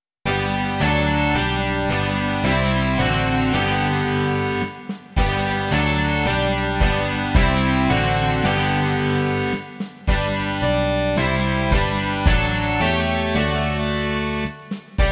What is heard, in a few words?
rock